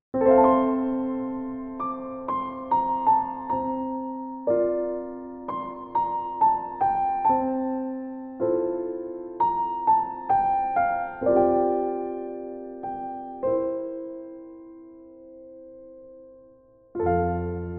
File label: heavenly christmas music instrumental christmas songs cello piano; colinde; CANTARI